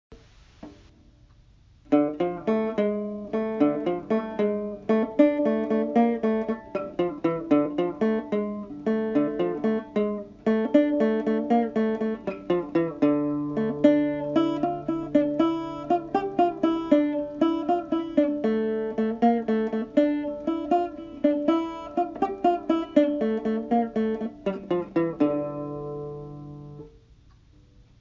tenor banjo

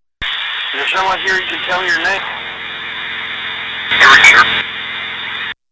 ghost box

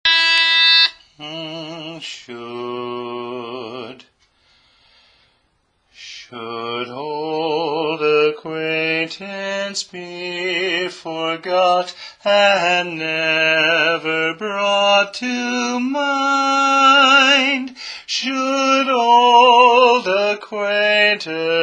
Lead; Auld Lang Syne

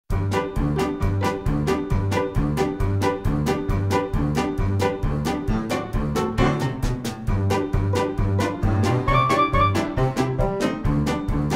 (Karaoke Track)

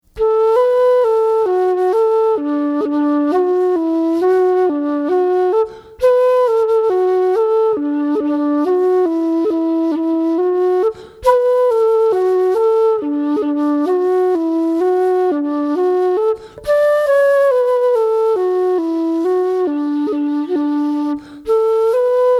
Slowest; Humours Of Glendart

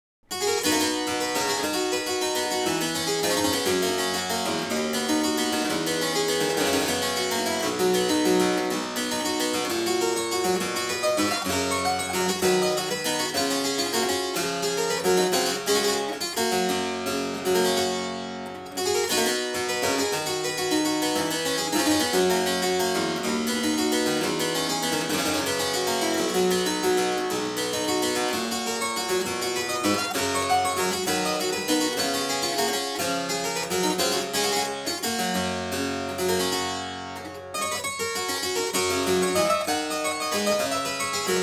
Courante

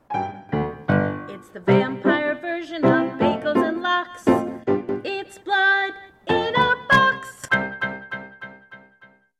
with vocals

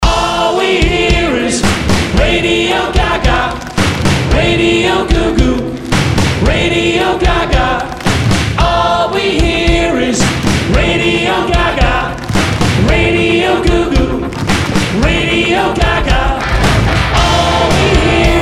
Chorus, Live

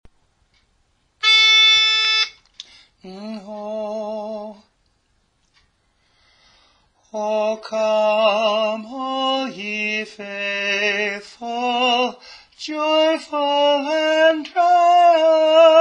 Tenor; O Come All Ye Faithful

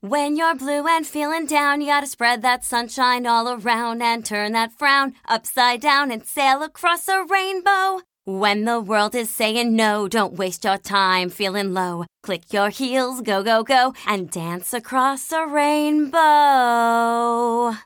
Song

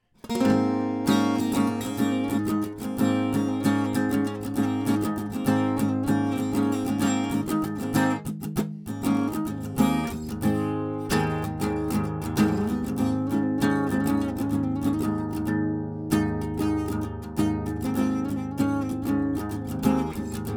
Studio AC GTR; 97 Percent Fire Studio

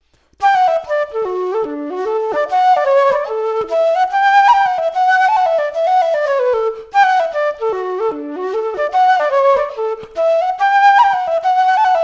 Hornpipe; Alexanders Hornpipe

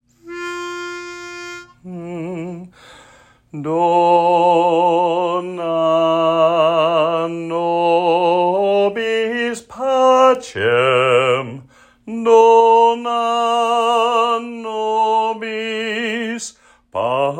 Bass; Do Na Nobis

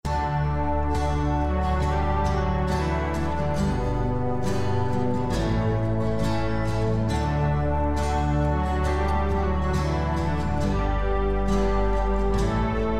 ocean dreams song

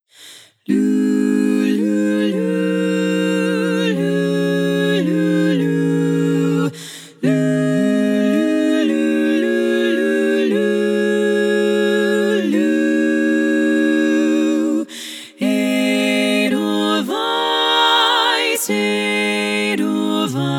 SSAA full mix

